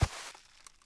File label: Foot Sand; Civ4 warlords